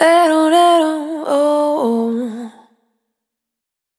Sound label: Vocal Samples, Single Voice Melodic Loops; Singles